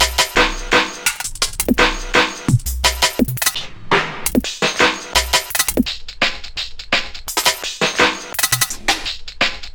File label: beat slicer; Max